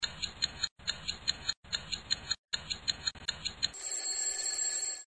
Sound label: countdown